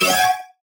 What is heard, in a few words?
Lead Shot F# Weird Synth, Dubstep; One Shots; Ghosthack; Dubstep Sound Arsenal